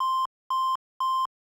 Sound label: Reload Soon